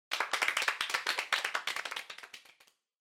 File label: Applause Far Short